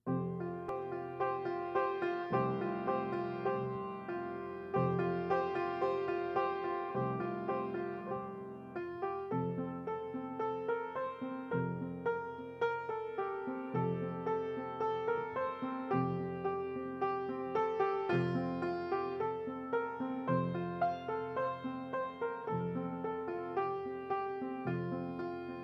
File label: postlude; On Jordans Stormy Banks